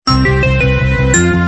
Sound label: scratch samsung